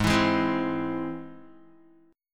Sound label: Ab Augmented Ab Guitar; Ab+ strummed; JGuitar